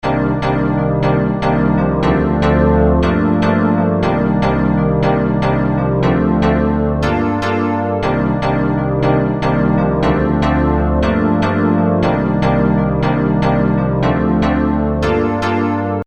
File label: Piano